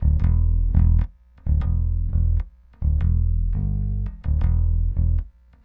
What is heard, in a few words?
Metal Deep Bass; Rock